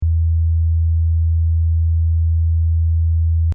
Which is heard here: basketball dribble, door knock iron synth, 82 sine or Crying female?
82 sine